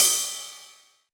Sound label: Ride, Yamaha DJX 2B